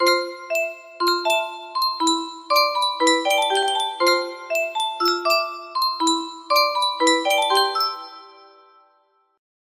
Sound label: electric piano